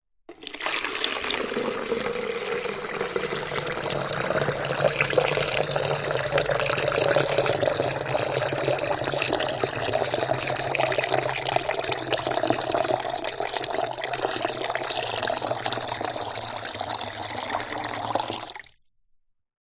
foley liquid water pour into empty plastic container